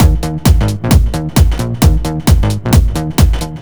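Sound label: Techno Groove No snare, 132bpm; Zero-G; Soundclash; Combi Loops; Nu Dance Electro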